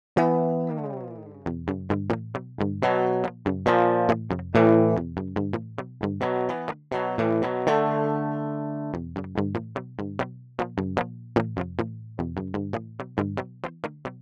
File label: riff direct